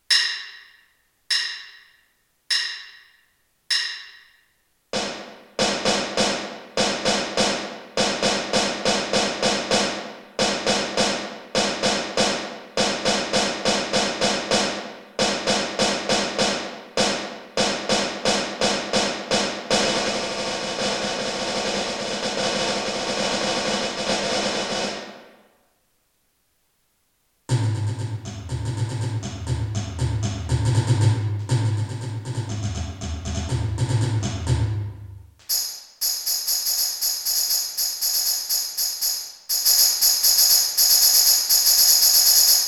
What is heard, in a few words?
multiple percussion